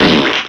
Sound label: fart wet short; Farts